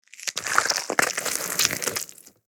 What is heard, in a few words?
Guts and blood squeezing slosh